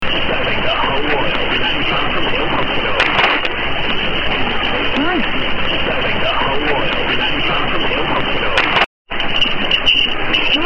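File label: 1350 unid hospital station; Mediumwave Dxing; Kingstown Radio, partial ID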